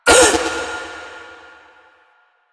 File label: Ring Fail; Jade Shadows; Birth Minigame